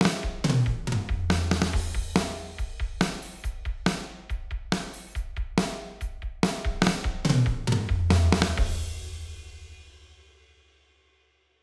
Swedish Metal Ambi Room

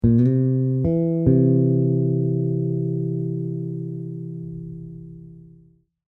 C to E M3, bass; chords and double stops on bass

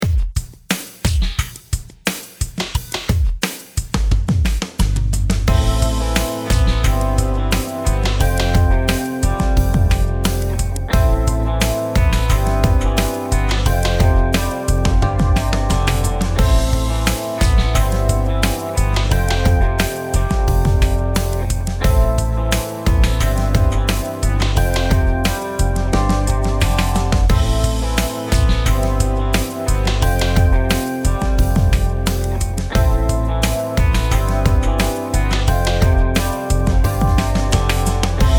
HX STOMP, guitar rig

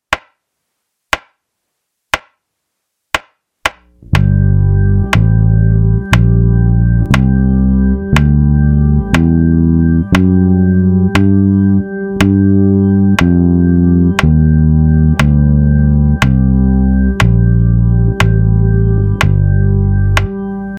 Melodic minor, bass; a bassists guide to modes

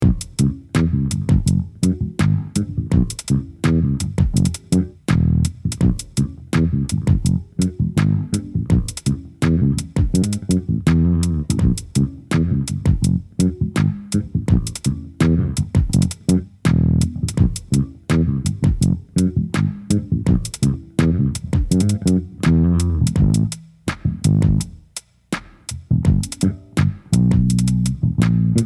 Bass Amp

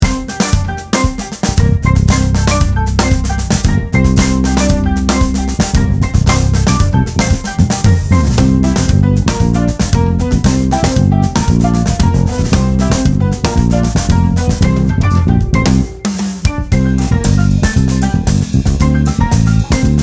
Samba, bass; groove; how to groove in seven different musical genres

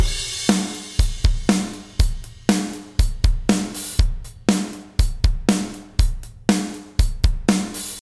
Rock Beat with backbeat snare

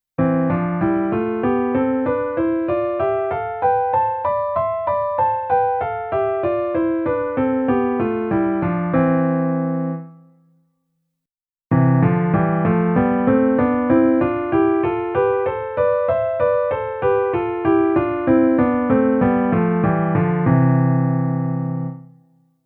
C scale in sixths and thirds

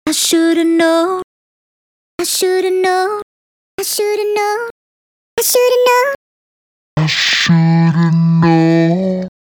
Vocal Chop

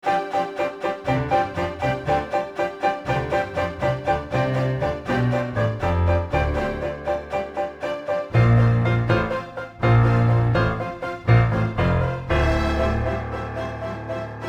Pno and Marcato

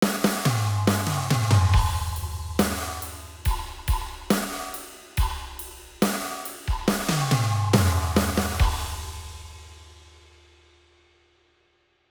Steel Ring Reverb Ring Mod

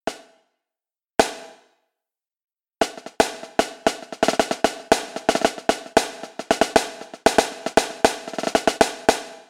Marching Snare

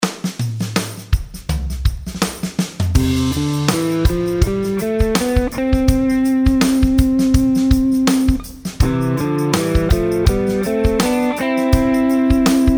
C MAJOR SCALE ADD 3RD ABOVE ADD 5TH ABOVE

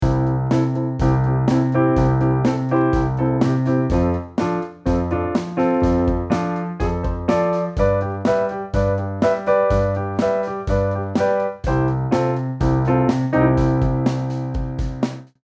5 6 rock movement with drums

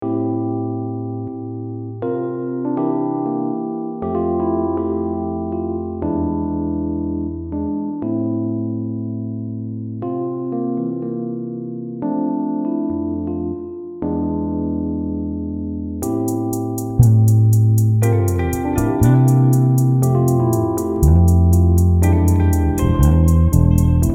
Roots Fifths Octaves

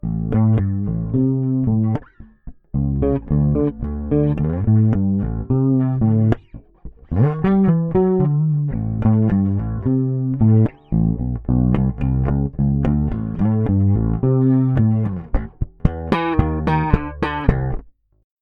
bass chorus

